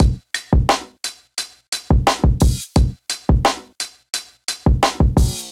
MPE Kit 87 bpm, Golden Era Hip-Hop Drums; MIDI Clips; Coldblood; Sound Oracle

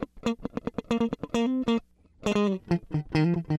133 Dance El Guitar Note F; Dance DJ

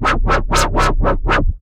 Wobble, Wavetable, Bass; Instruments; Razo Formation Wobble; Ableton; Synth Essentials

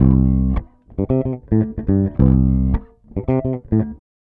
110 Old School Bass C, E-Bass; Pop Rock

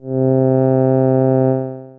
Dreamers Pad, Synth Pad; Ableton; Factory Packs; Samplification

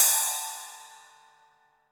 Cymbal Bell; Latin Percussion; Drums; Drum Hits; Ableton; Factory Packs

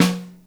SN Overhead, Snare

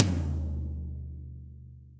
Tom Floor Studio Basic; Drum Hits; Standard Hits; Ableton; Factory Packs; Session Drums Studio

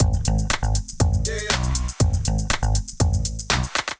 Monuments Kit, Drums; Ableton Factory Packs; Beat Tools

